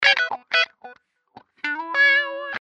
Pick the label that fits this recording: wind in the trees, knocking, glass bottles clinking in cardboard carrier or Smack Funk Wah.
Smack Funk Wah